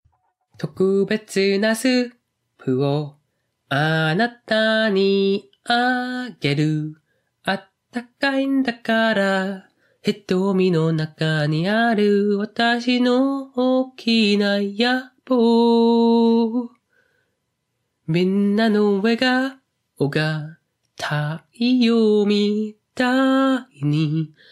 Singing

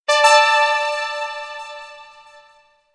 Online Notification

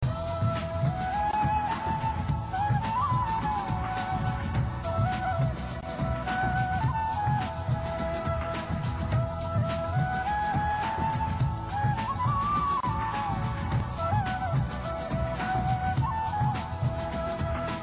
Sweet Flute

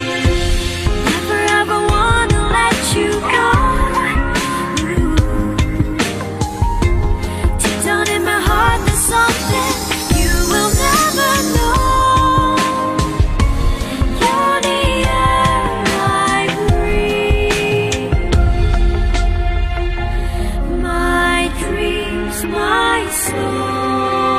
Chinese ost

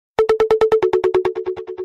Short Sweet Sms

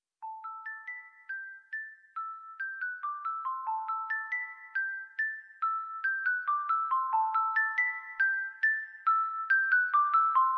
Clock tower theme

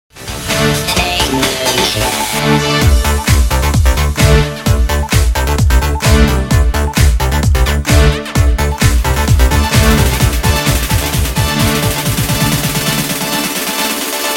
Techno Cat

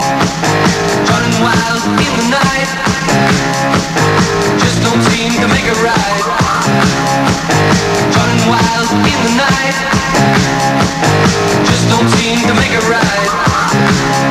House Music

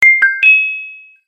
Tripple Ding Notify

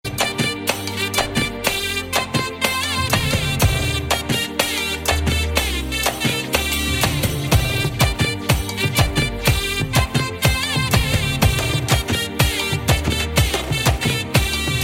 Bit Tone

